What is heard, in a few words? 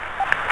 chat allies; World of Tanks Blitz; GUI chat